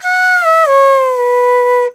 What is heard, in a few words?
VENU, Old World Instruments, World Winds; E-MU Producer Series Vol. 7 – Old World Instruments; World Combos